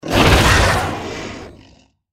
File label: gecko combat idle; npc